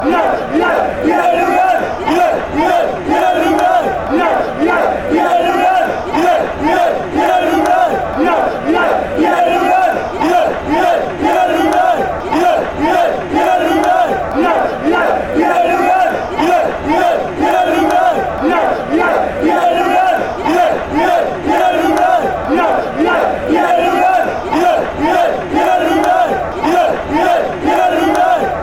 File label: chant, fanchants; douglas haig